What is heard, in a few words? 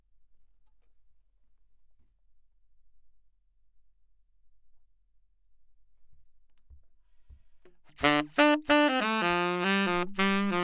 Sax